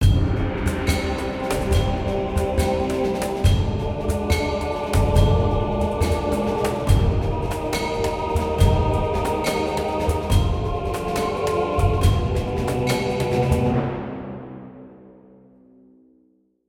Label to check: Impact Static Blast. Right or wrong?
Wrong